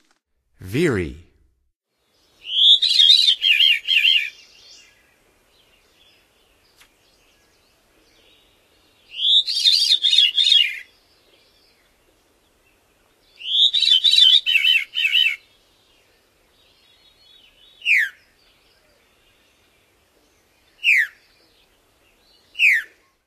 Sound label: Veery, Songs of Birds of North America; Stokes